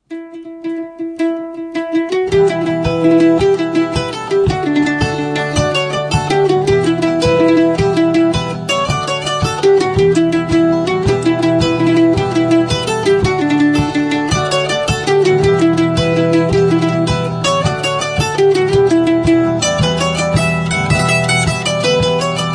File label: swallow tail jig traditional irish song mandolin amp guitar